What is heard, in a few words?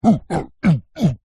fantasy giant male vocalisations fighting